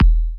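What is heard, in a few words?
Bass drum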